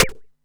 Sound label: Perc, Nord Modular Drum Samples; Yawgmoth